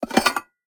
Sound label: canopic jar open; skyrim